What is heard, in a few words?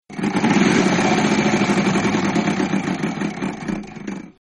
wheel spin